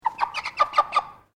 Bird Chicken Koeyoshi Clucking Group, Chickens in northern Japan; Birds; Animals; SoundEffects-Foundation1